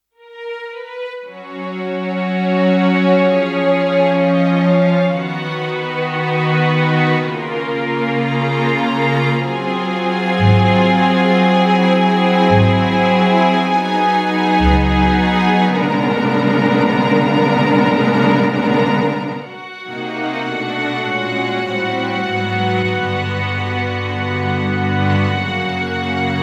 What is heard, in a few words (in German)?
Akustik Strings; SuperNATURAL